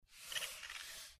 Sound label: Short Squeaky Mouse